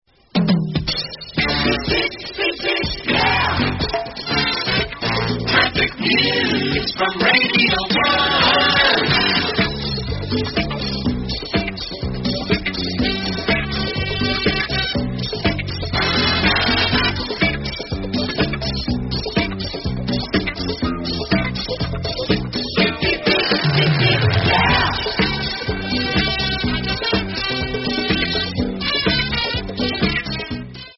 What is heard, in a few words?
radio jingles traffic news